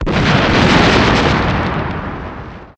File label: magic hard